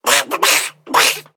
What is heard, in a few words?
Ducking Detection Enemy Sub; World Of Warships